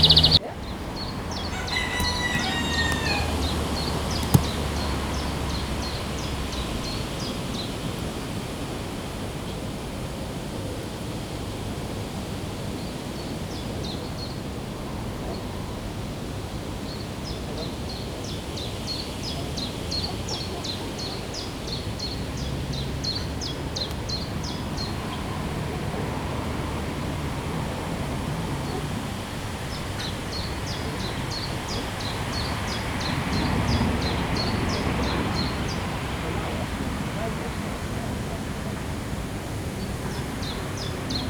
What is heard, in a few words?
wind - rooster - insects - people; moms place; fieldrecs